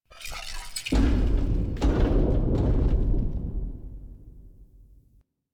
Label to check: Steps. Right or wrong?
Right